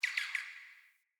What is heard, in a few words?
Bird Song; Paragon Bird